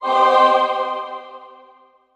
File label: Music Effect Choir, choirs; Short Female Choir Chords.